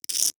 Foley Glass Scrape Dull Glass On Cinder Block

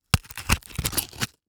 Horror Scary Bone Scrape

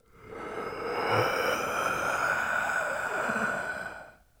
Horror Scary Human Male Deep Inhale Slow Ghostly

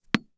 Sports Ball Tennis Ball Bounce On Cement Single

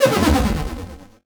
Transition Laser Warble; Production Element

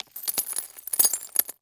Crash Glass Debris On Concrete; crashes; AdobeCC

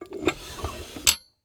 Table Break Away Table Leg Movement Up, Household

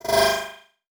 Beep Multiple; Multimedia Internet CD-Rom Flash

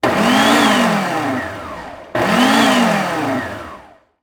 Household Blender Pulse

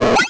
Science Fiction Sci-Fi Electronic Computer Button

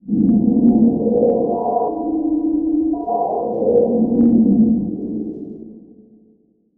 Production Element Title Transition Demonic

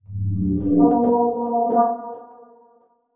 Transition Sweep Drone; Production Element

